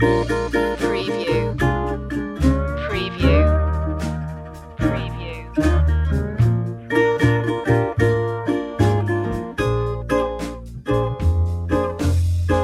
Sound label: blues loop